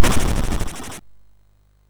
Fire Breath, MOS6581R3

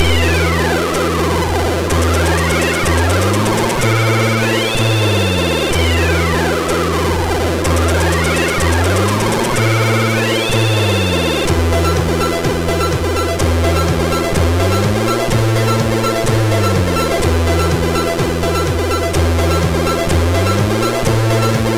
Intro, MOS6581R3; Lazer Intro

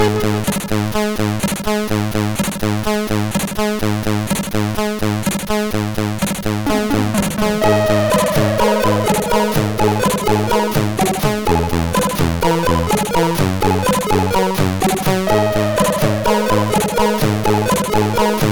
Intro, MOS6581R4; Lions

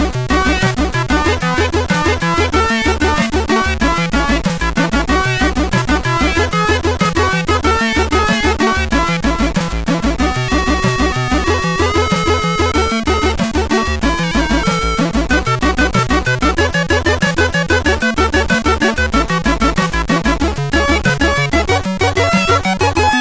Welcome to Hell, CSG8580R5

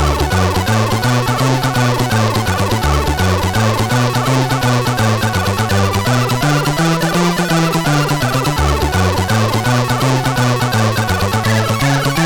Sound Example, sid CSG8580R5; hvsc; FAME; Hendriks Michael

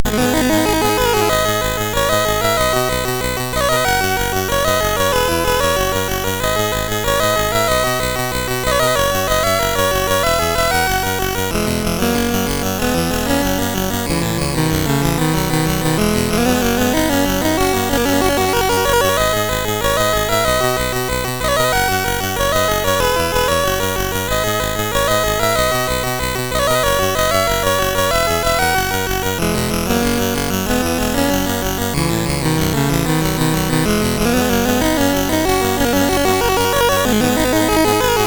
sid MOS6581R4; Flying Shark

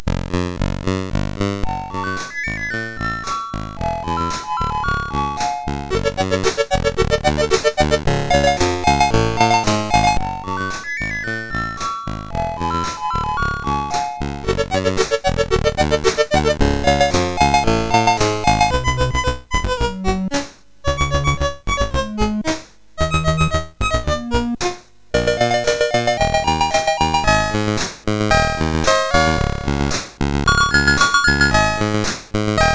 sid MOS6581R4, GAMES; Fairlight

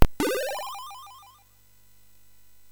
Fuzzball, MOS6581R3

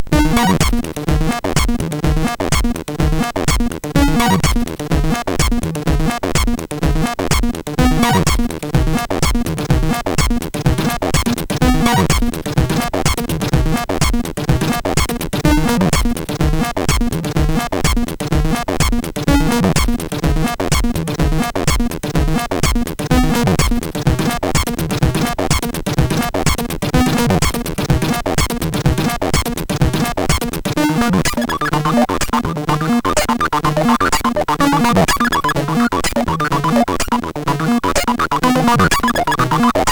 Rise Phin Loop sid, MOS6581R4; hvsc

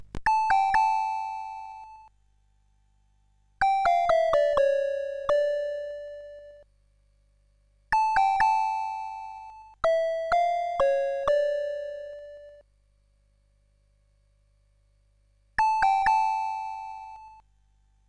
Toccata, sid CSG8580R5

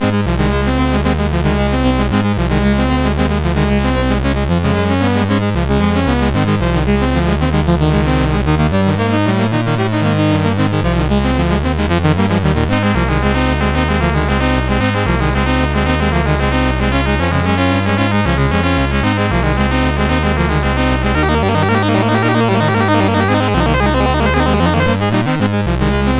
Super Cup Football, CSG8580R5; hvsc

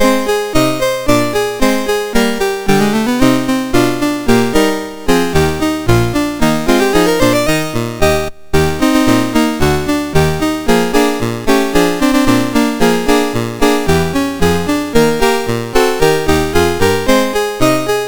Victory March, MOS6581R2; Notre Dame Victory March

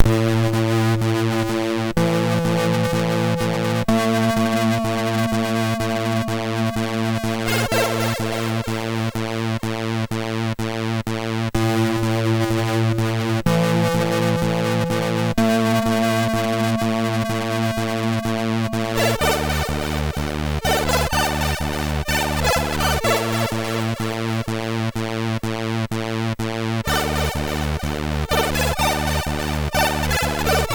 Mega Sound, sid MOS6581R3

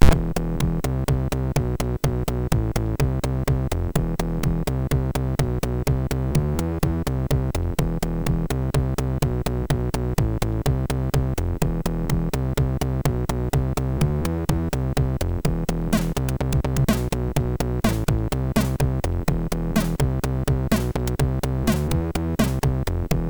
Dnb, MOS6581R3

